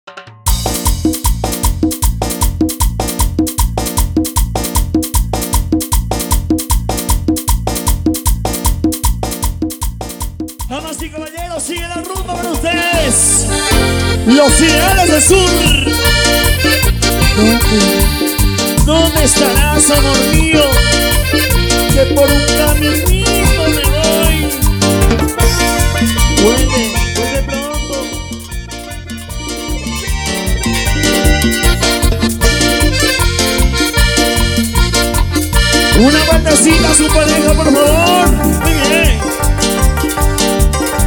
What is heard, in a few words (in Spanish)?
Intro Animacion, Bpm 154